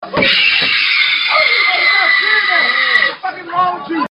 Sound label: kid screams in class; meme